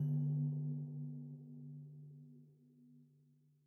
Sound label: Citadel Bells; Frozen Flame; windows